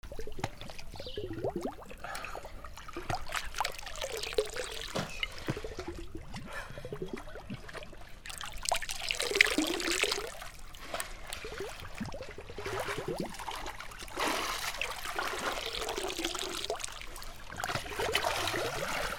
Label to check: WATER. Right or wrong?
Right